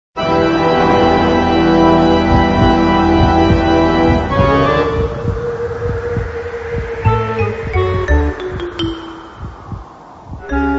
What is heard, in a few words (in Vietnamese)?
tieng quai vat organ rung ron